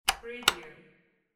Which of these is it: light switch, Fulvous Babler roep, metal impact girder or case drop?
light switch